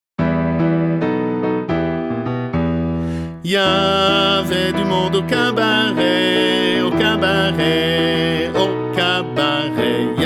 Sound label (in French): roi jazz